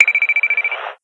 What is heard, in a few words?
airdrop appear; cstrike; pubg